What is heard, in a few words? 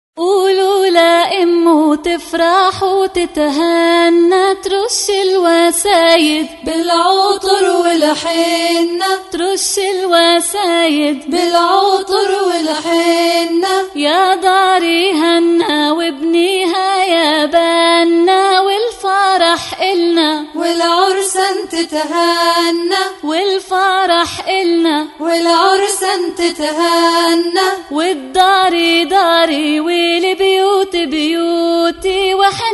Palestinian Folk Song أغنية من تراث العرس الفلسطيني